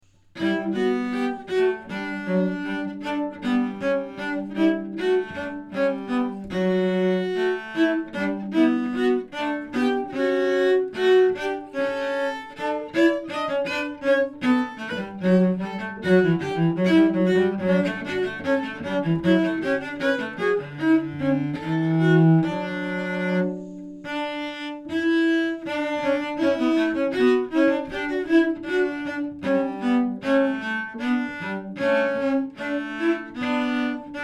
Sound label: Etude for 2 Cellos